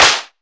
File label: Ice Clap, Dubstep; Ghosthack - AC2024 - Day 13 - Dubstep Sounds 2025